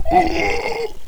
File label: death